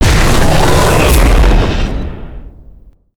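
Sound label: spawn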